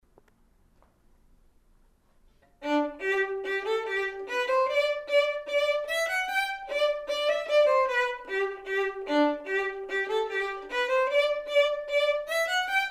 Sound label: vln perf; Building Technique with Beautiful Music, Book 1 Violin